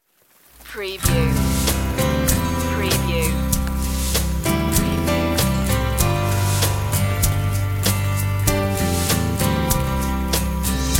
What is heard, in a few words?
underscore